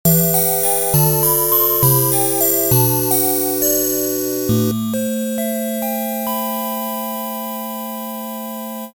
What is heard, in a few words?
Game Over; Legendary Axe